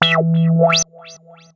Bonus

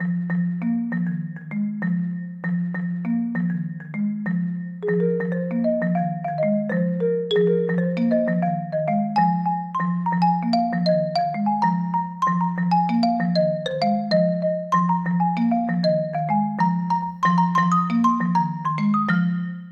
pipe walker loop

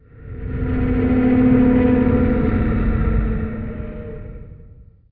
Ambient; unreal development kit

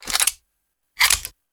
pistol reload; Shattered Horizon

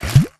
Candy Cannon; Candy Crush Soda

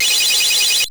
Jingle; Masterblazer; amiga

